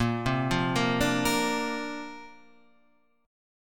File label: C 9th Suspended 4th A sharp